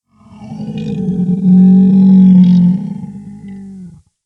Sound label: Big wild cat slow moan